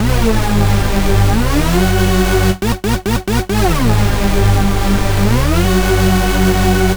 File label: Hoover Bumper